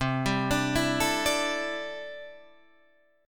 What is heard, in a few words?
C 6th Add 9th C down Guitar Open D